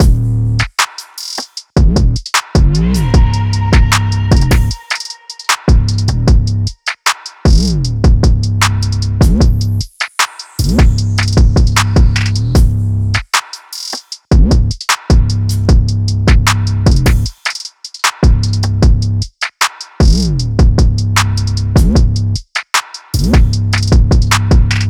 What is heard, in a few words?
153 bpm drums; looperman